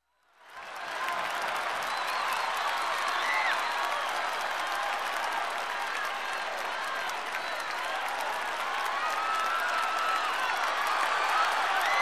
Crowd in a sports game warm cheers

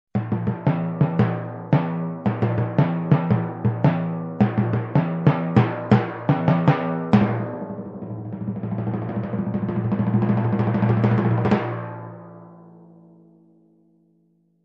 Timpani drums reveal beat

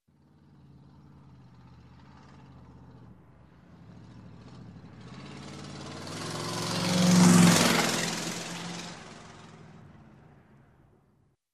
Old Truck Passing by

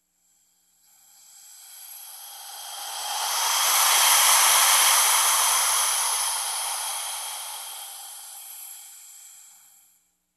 Oxygen Tank hissing fading out

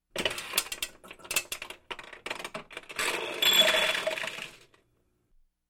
Filling in Ice Cubes

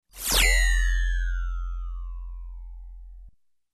Laser Drop

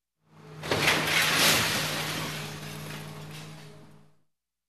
Broken Glass with Car Passing By Sound

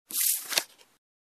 Play Cards Shuffling

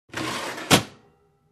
Kitchen Drawer close

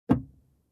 Opening compartment

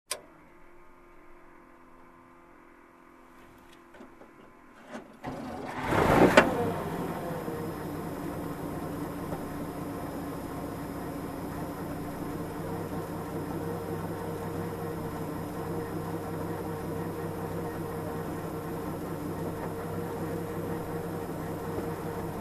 Military Truck engine ignition and idle

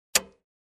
Switch Click

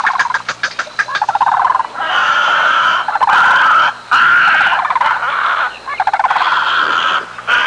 Aviary sound

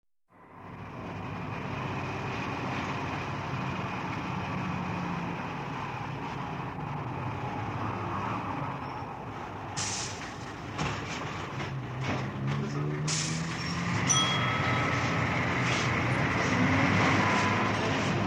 Semitrailers

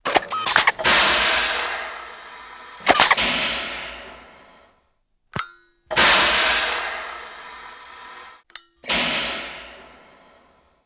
Switches and Air Compression

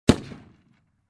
Gun Shot once

